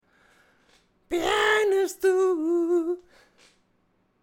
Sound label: Rattle male